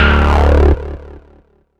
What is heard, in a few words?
Alien FX; Sonic Foundry; Underground UK House & Electro